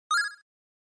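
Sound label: Game Boy General Pitch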